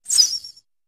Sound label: Arrow Whistle Bys, bow & arrow